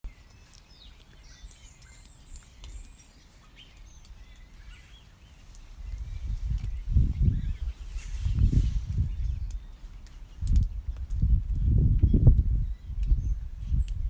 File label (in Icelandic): Umhverfishljod